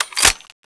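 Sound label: p90 magazine in, weapons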